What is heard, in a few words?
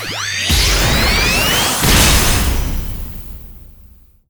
Sp Zantetsu ball Slash